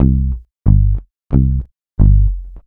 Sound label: COUNTRY; Best Service Advanced Media Trax Modern Composer